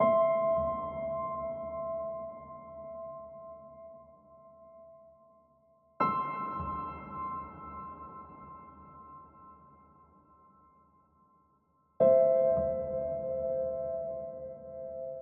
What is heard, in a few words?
Piano Roll